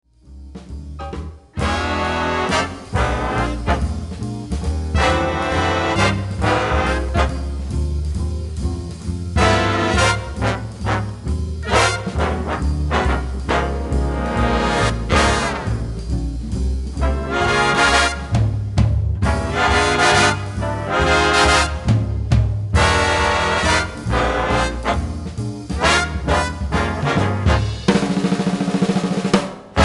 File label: medium swing